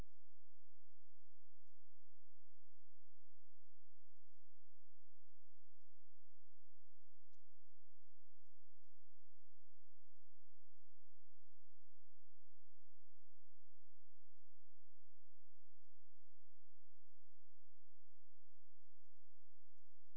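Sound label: hydrophone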